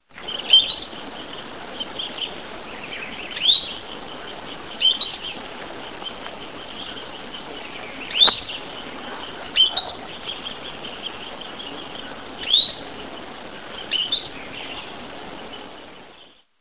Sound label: Synallaxis cinerascens Pi Puí